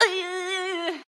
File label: Electric; Going Under; Jackie